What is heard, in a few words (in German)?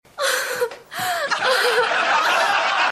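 Schluchzendes Mädchen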